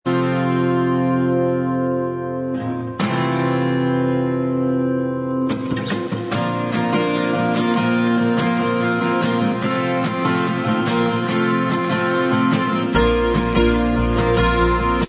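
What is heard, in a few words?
acoustic instrumental track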